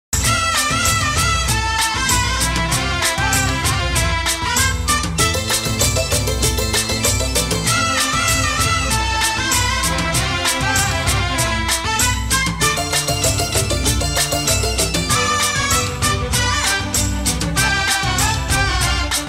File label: shehnai; played by padmashri pandit dr s ballesh